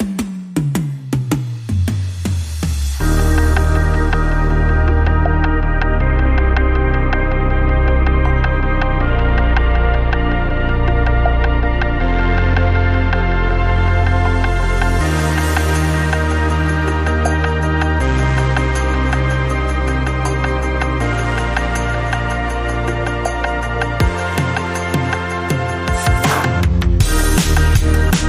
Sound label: Addictive Synths, Rhythm